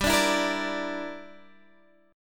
G Minor 6th, Guitar Open D

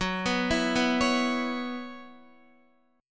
Gb 7th Suspended 4th Gb down Guitar, chord

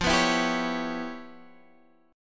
E Minor 6th F; Em6/F Chord {X 8 9 9 8 9} strummed

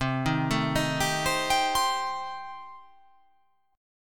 C Minor 6th C

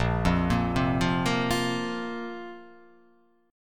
A sharp Suspended 2nd down; arpeggiated; A#sus2; JGuitar

